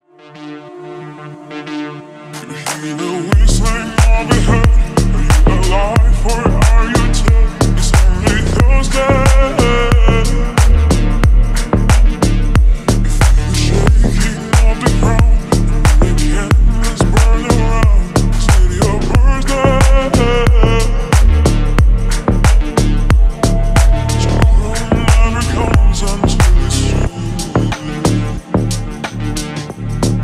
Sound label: slowed & reverb